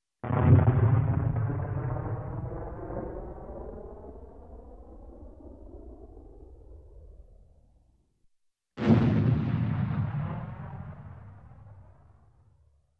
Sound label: Explosion in the distance; explosions